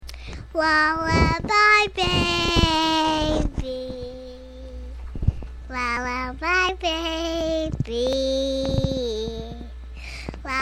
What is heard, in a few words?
lullabye